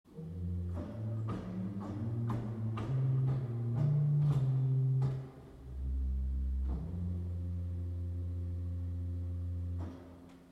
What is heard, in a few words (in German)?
Octavbass 8